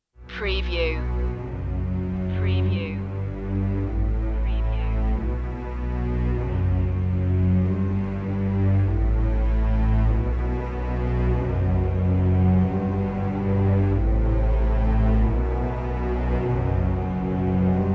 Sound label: no choir